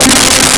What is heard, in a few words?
Syn Brs C3; BGM; Nintendo; July 2020 Gigaleak; DrMarioSound